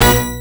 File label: Orch Hit, Genesis; Tiny Toon's; M8